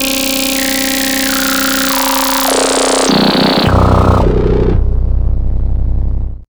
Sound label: CYBERGUN R, Hard & Loud Techno; USB Soundscan vol.01; AKAI